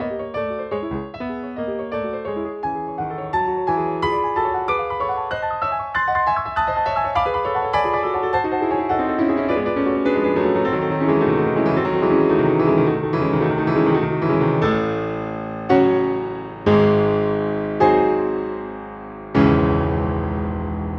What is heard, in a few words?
piano